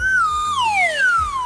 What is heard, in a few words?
sad slide whistle